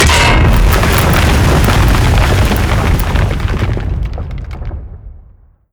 impact rumble, smokestack; destruction; Half Life Alyx

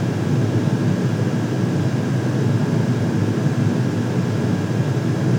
interior office, ambient; nuke

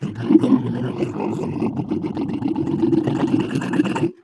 ballbot angry rant; player; Portal 2

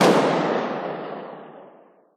negev distant; weapons; Counter Strike Model Pack; CS2

